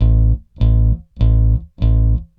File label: BASS, ROCK POP; MAGIX